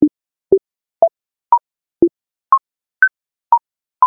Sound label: chant